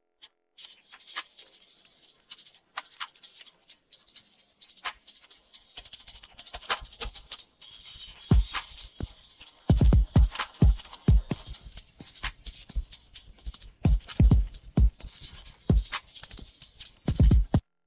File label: drums, separated; htdemucs